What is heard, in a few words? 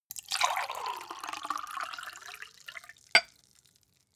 glass pour clank; soundpool